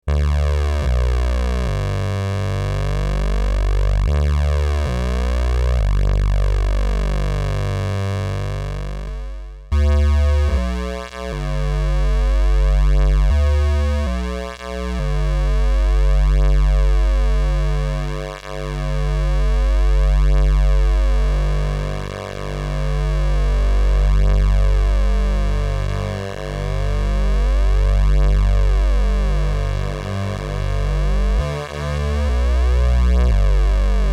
pwm

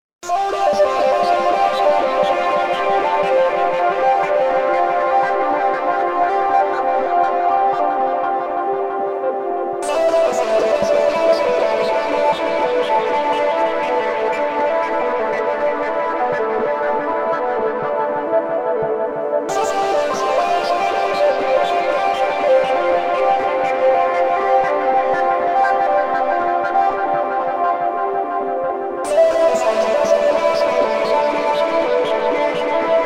Pigments Mod Quantize Function

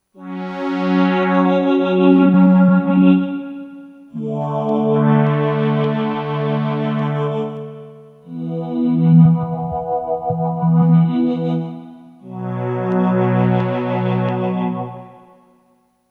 Pad; MPK Mini Play

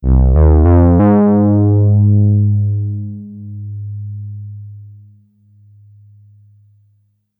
Behringer Toro

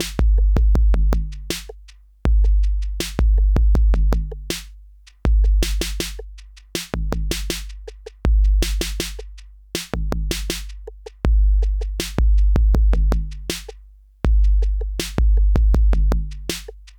Chandler Limited Zener Bender Hardware 808B LIMIT; Softube

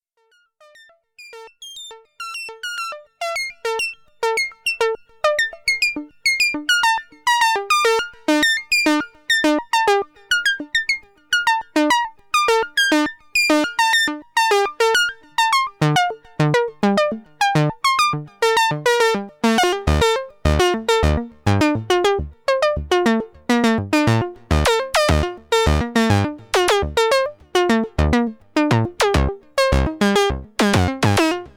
A109 high sequ

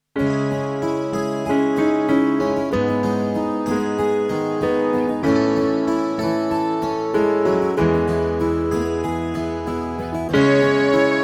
ARP Violin

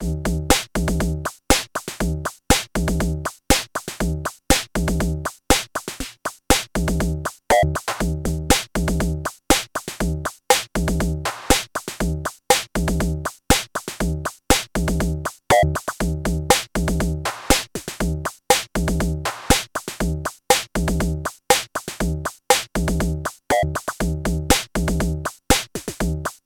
Transient Plus RING Mod by OSC1 CV; Future Retro

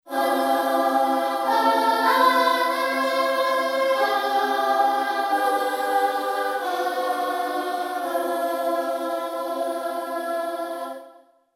Magix Acid Pro 10 Childrens Choir

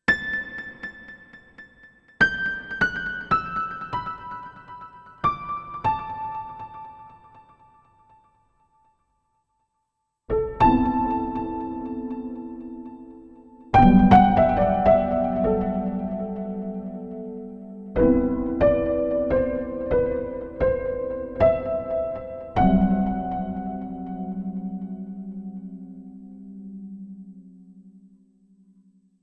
Digital Piano; NADA Instruments

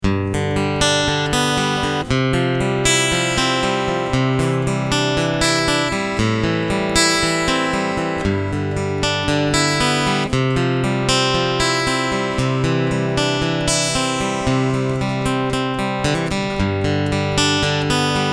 Picking Preamp EQ Full

